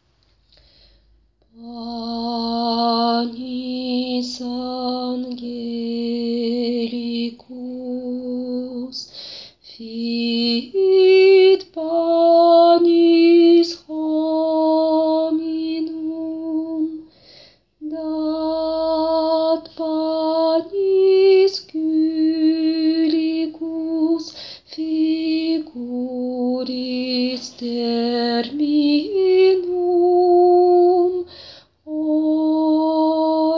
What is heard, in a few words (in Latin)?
Panis angelicus altis